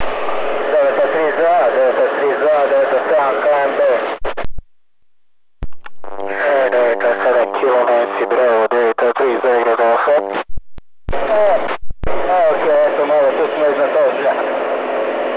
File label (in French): avion mono